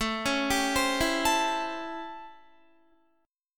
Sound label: A 7th Sharp 9th A, Guitar; A7#9; arpeggiated; JGuitar